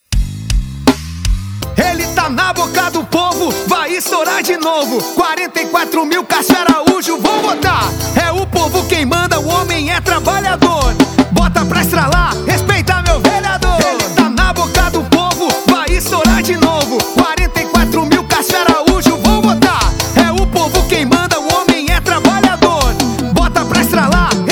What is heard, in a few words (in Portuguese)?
Jingle; Cassio Araujo